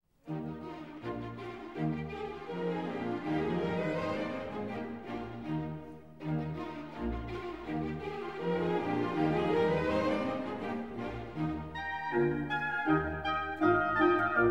Sinfonia Concertante For Violin and Viola in E-Flat Major, Presto; Mozart; K. 364